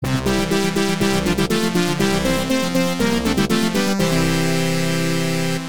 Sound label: Success (Stage Clear); Dark Seal; arcade